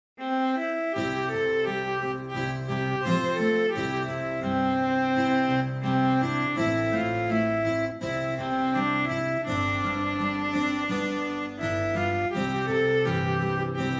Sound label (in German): Elfen Schlaflied instrumental